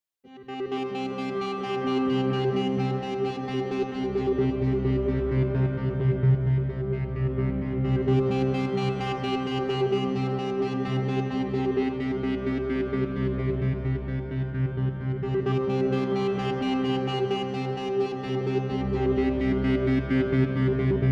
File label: Parallele Guitar